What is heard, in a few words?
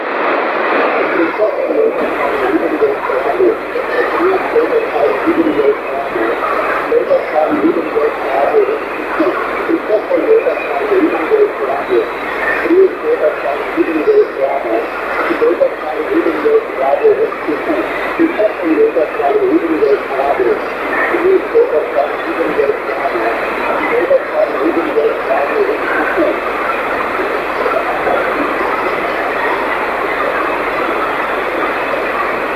SSB -130dBm + DNR; bhi; SN-Vergleich